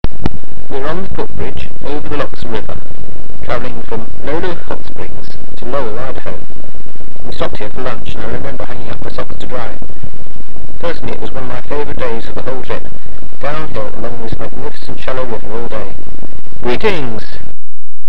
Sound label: lochsa River